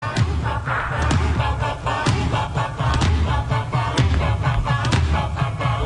musica prehistorica

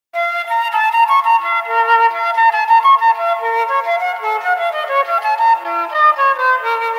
flauta envolvente

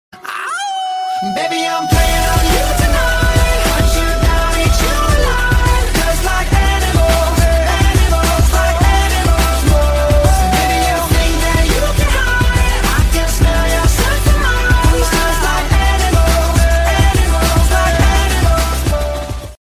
aullido meme

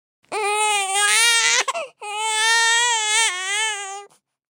bebe llorando

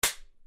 claqueta

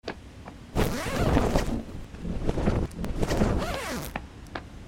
pasos ropa cremalleras

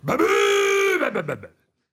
bocina

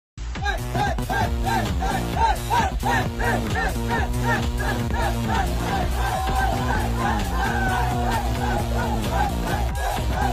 hombres celebrando

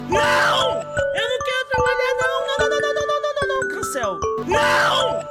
despertador; shiba

